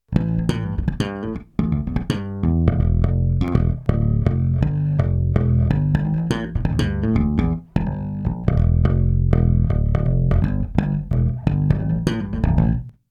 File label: bass